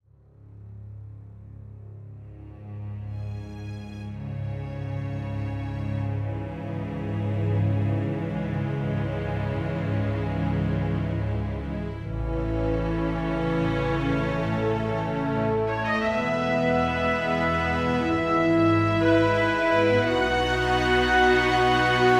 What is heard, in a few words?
Strings plus Brass Track